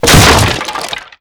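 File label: wood crate break medium; Explosions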